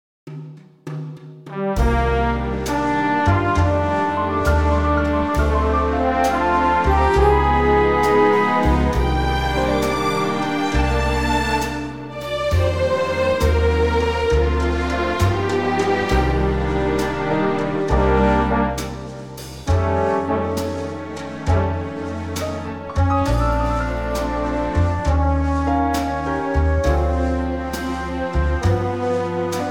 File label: orch Bb; key - Bb - vocal range - G to C